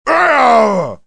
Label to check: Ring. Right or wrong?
Wrong